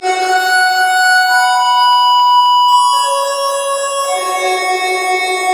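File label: extra lead (spooky)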